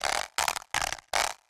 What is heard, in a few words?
door; Shin Megami Tensei IV Apocalypse; 3DS